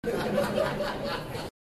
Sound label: loop